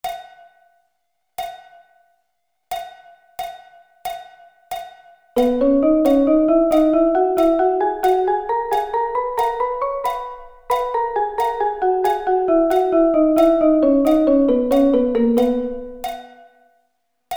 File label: B Double Sticking